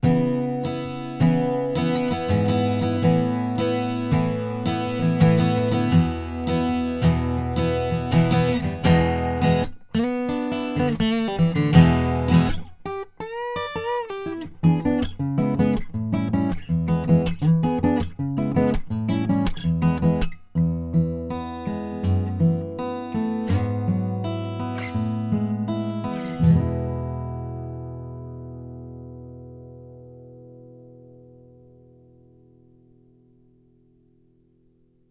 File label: Ac Gtr Soundhole